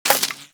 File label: Bullet Impact Debris Wood